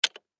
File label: Technology Computer Keyboard Space Bar Single; Typing; Offices